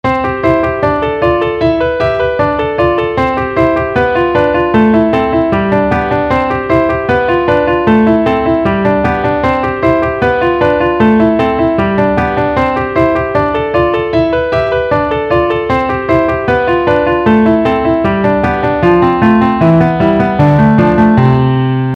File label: Variation of Twinkle Twinkle Little Star